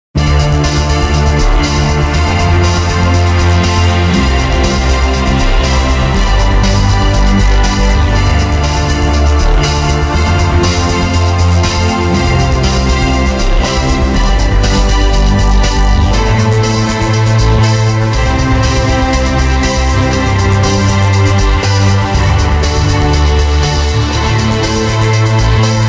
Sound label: vocoder rhythm